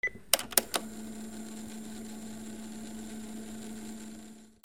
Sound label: PHONES